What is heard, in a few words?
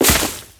Crewman Step Veg, Footsteps; Warframe; Corpus